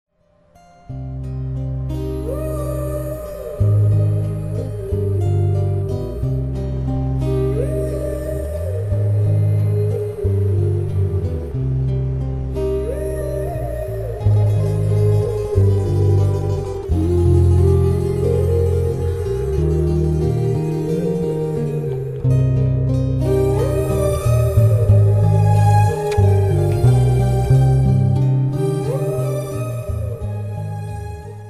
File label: malayalam movie bgm; charlie